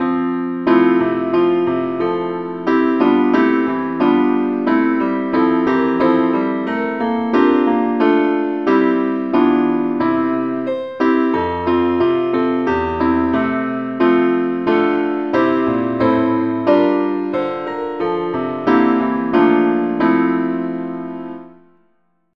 keyboard only key f

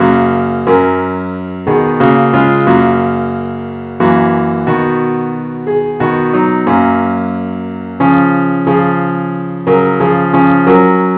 parts only key ges; i need thee

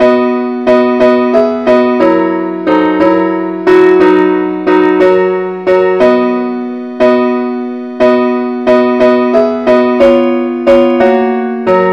parts only key; key b; angelvoices

